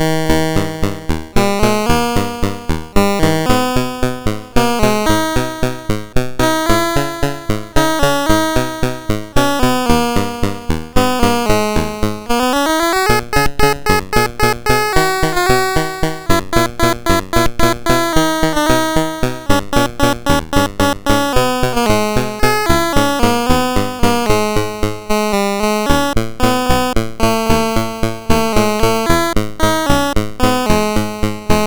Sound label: sid MOS6581R2; Donau Music